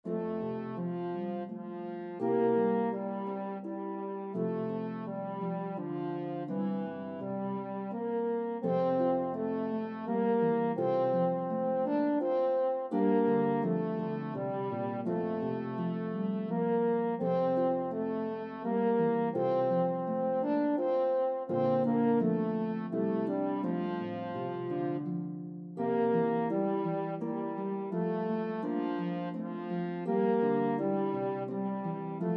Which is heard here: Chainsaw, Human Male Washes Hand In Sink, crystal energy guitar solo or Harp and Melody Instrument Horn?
Harp and Melody Instrument Horn